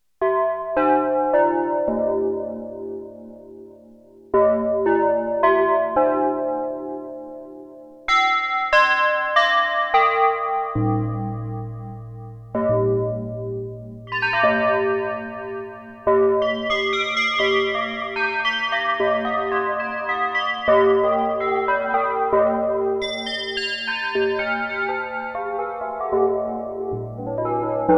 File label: Church Bell, Roland JX-8P